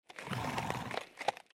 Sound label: Approach; Age of Empires III; cinematics